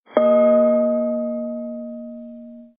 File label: Sms Gong